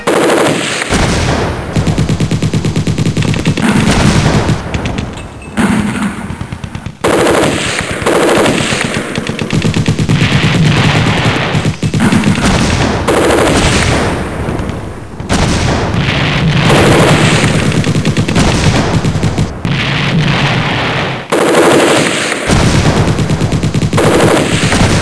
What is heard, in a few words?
firefight